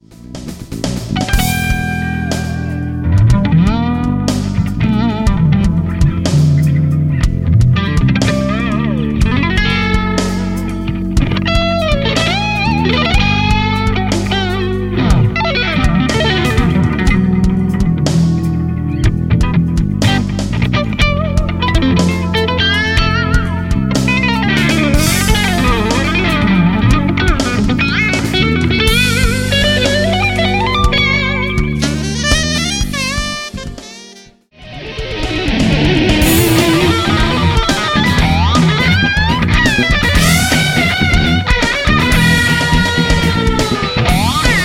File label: fretless guitar